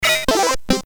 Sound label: Jingles, CSG8580; hvsc